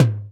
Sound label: Tom; Tom LD; Ableton; Factory Packs; Drum Hits